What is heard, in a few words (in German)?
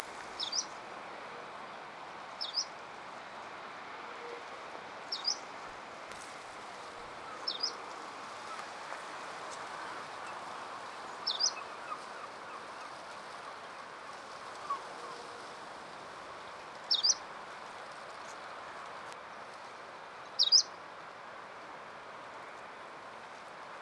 tienshanlaubsaenger